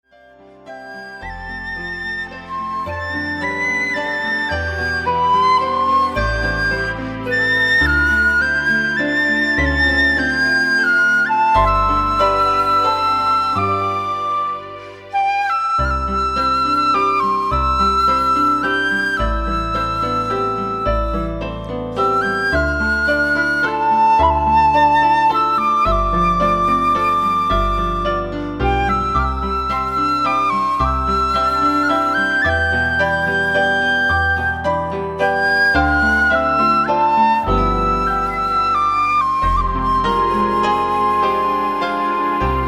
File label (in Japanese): fue